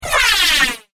Jet Pack Rocket Booster, spacecraft sound effects; science fiction sound effect; star wars / trek style games

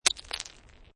Snow And Ice Bits Of Ice Falling On Ice; Sound Effects:Weather

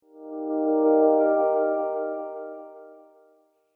Subtle Waiting, beep sound effects; object sound effects

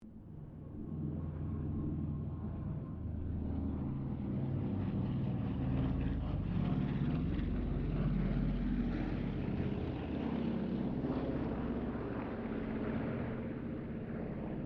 AIRCRAFT TROJAN SQUADRON 4 PASS DISTANT; Mixed aircraft squadrons; Airplanes; Vehicles

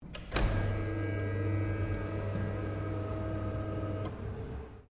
Door Pivot Automatic Electrical Open; Various doors; Miscellaneous Doors

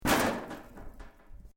METAL LIGHT CRASH; Materials

